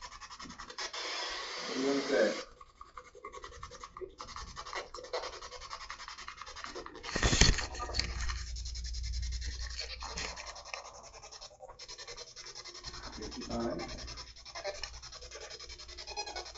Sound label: 5th Floor Walking Spirit Box